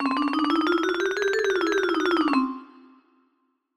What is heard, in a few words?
Jingles; Banubis